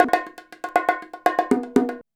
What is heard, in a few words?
119 bongo; Soundscan vol.56; Modern Percussion Loops; AKAI